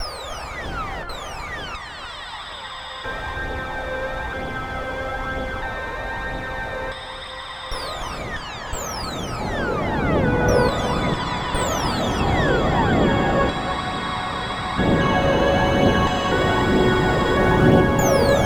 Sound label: C, Synth Line; 90 s Sample CDs; NiceBeats; SynthLine VOL-13